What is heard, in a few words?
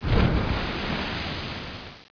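splash big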